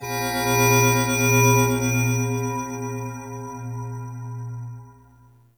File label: Temple Moving Bells; Ambience; Corpus Ship; Temple One